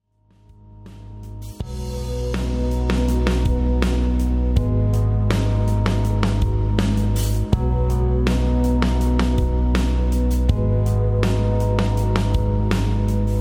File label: rhythm